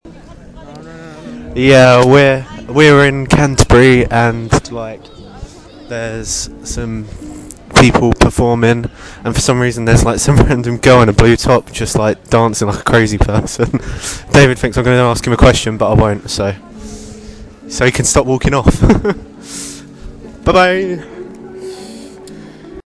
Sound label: live music in canterbury